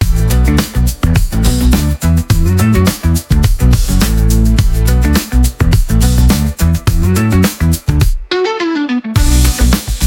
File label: 105bpm, disco fever